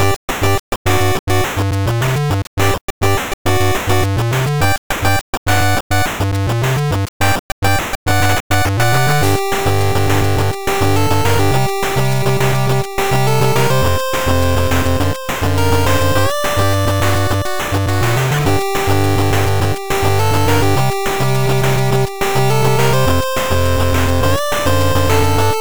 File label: 8bit